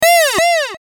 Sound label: unknown animal; sfxr